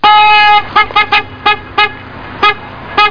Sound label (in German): hupe